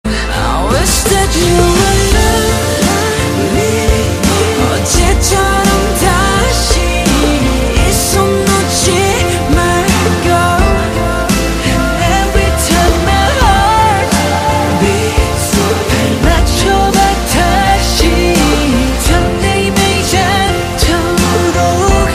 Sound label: heartbeat